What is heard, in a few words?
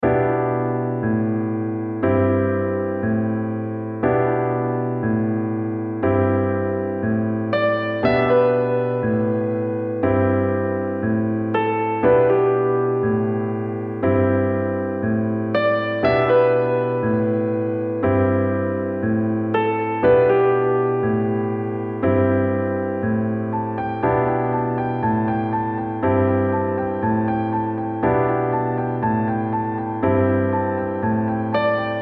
loopbgm raindrop